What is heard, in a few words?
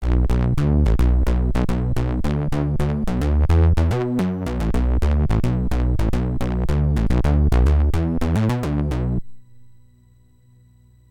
filter 6581; midibox sid v2